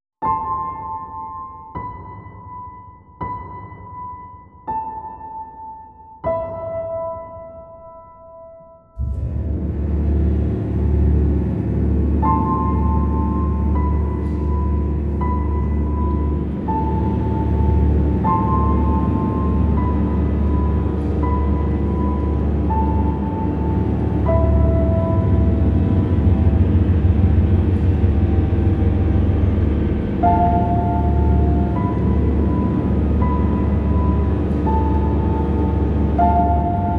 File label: piano reverb; Expediente Triana; En busca de una gótica en pantalla